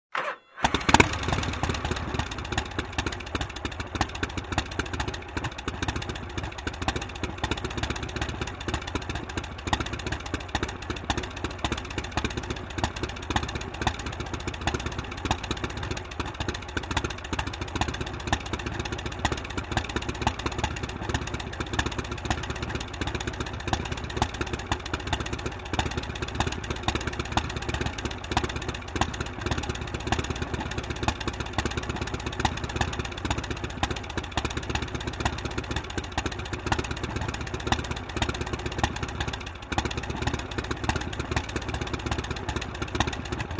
Harley-Davidson motorcycle starts, waits, turns off